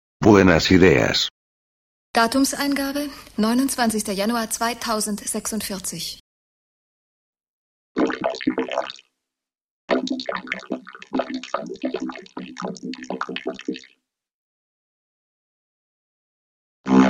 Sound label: Vocoder Plus vox; XILS